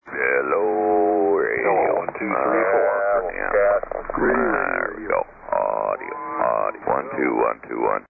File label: SSB Net Tune Up